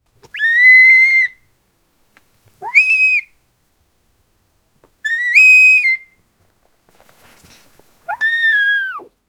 horse neigh whinny single

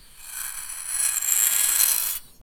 carving scraping, woodworking

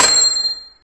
axe

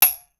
one clean metallic bead, pinball

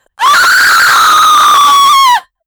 female attacks fierce sho; battle

